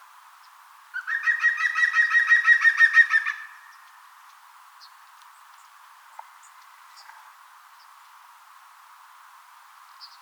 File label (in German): gesang gruenspecht; vogelstimmen; nabu; lars lachmann